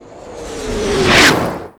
artillery incoming; Explosions

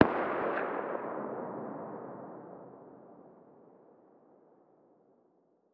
sar attack far distant; Rust

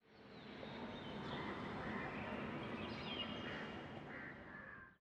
ambient battle; World Of Warships

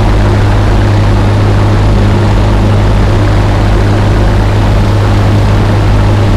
LAV25 Engine Max; DCS

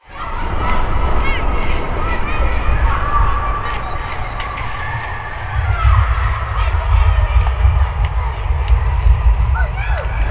playground; Call of Duty Modern Warfare

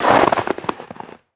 foot snow deep, footsteps

